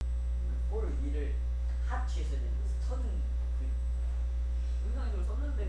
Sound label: sea